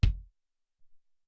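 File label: Low Kick, drums; Alesis SR-18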